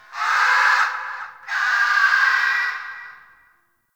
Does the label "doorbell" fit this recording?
No